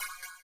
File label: CYM, Ethno Techno; ILIO - Ethno Techno